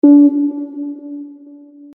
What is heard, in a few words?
Synth Shot D; BIJOU; UNISON